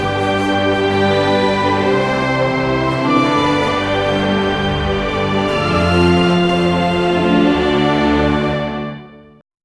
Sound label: epic but soft orchestral; documentary